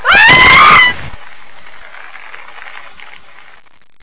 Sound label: hanger scream